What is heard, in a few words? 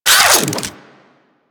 Air Pistol Reload; Warframe; Corpus